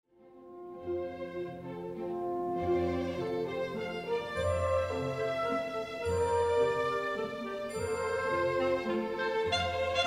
Tempo bardzo zwalnia w chwili przejścia do tria, w którym zwracają uwagę sarkastyczne odzywki klarnetu; Barbirolli; IV Symfonia Gustava Mahlera

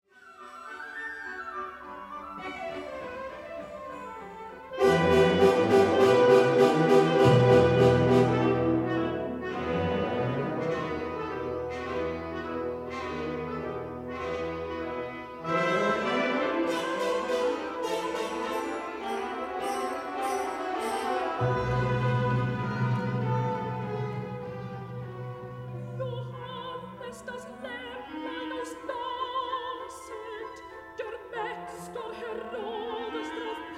skrupulatnie wydobywanymi z faktury detalami w rodzaju nawoływań waltorni; Horenstein; IV Symfonia Gustava Mahlera

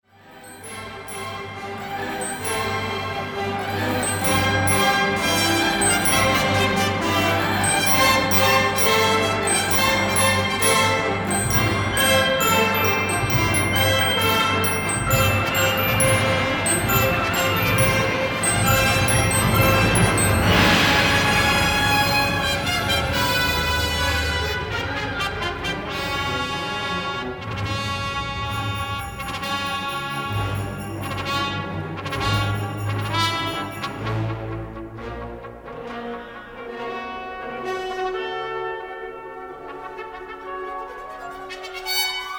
Dyrygent nie zwalnia nawet w kulminacji; przez co fragment ten sprawia wrażenie niedogranego i niedopowiedzianego; IV Symfonia Gustava Mahlera

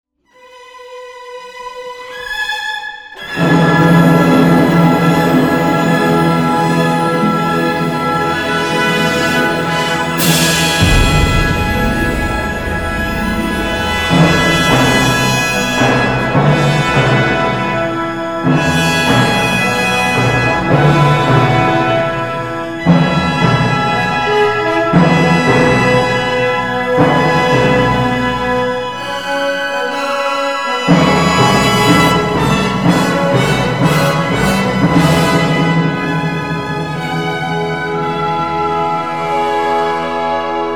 ostatnia kulminacja, w której ponownie słyszymy potężne, głuche uderzenia bębna basowego; IV Symfonia Gustava Mahlera

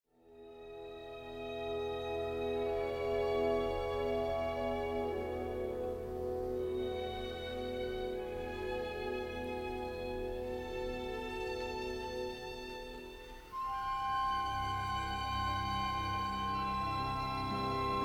Powolne wygasanie muzyki na końcu; jest zjawiskowe i świetnie wyczute; IV Symfonia Gustava Mahlera

